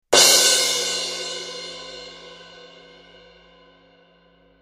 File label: Sabian 16 HHX stage crash